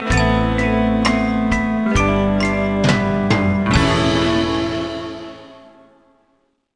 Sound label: Romance Fail Stinger; bully scholarship edition